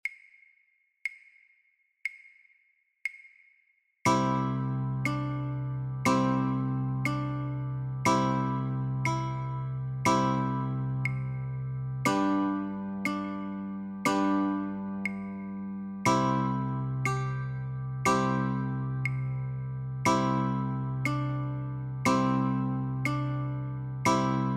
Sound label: Notes and Chords, Guitar; Mary Had a Little Lamb